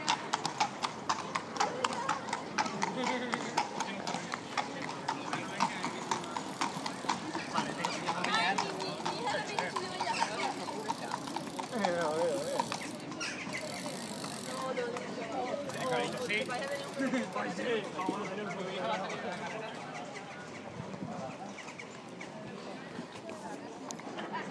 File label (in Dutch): Sevilla in geluid